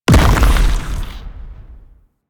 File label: Monster Projectile Explosion; Warframe; Infested